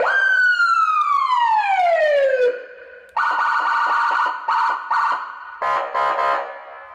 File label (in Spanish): sirene policia